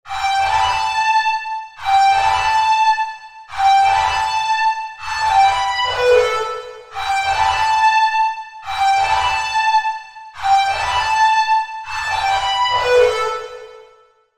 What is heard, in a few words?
Lead; Routed Sound; Spire Expansion - Flame Summoner Vol.1